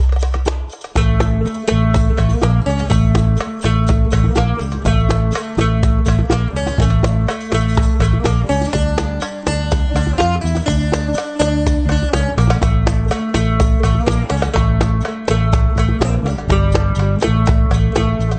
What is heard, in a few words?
Hijaz